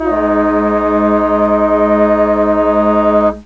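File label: foghorn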